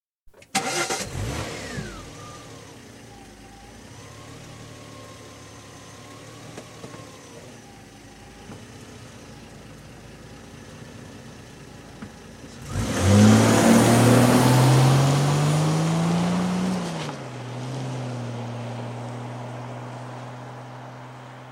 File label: Jaguar Sovereign, exterior, switch on, fast depart (36 litre, 1986/7 model); Cars; BBC